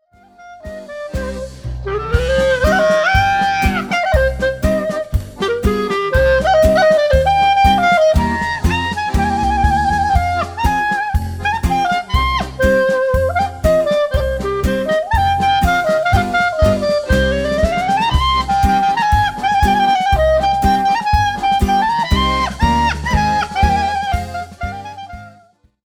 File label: Klar